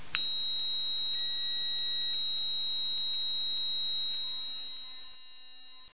Tone Intermittent 970-800 at 3x500ms Pulsed Sweep, 1.5s Silence, Repeat